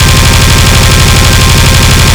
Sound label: tank fire loop